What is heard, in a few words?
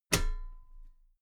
metal ladder step regular